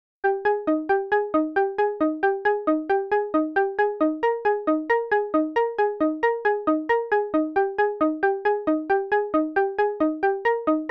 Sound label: Arp Minimoog